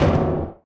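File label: panels impact small, metal; physics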